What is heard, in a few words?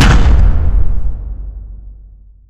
rat maze up stop; Source Engine; Portal 2